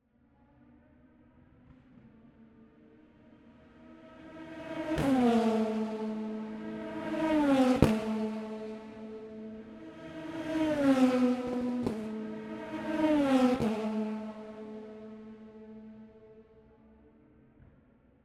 Race, Pro Mazda, Qualifying, Straight, Singles, Steady, Spread Out, Backfire; Airborne Sound; Race Cars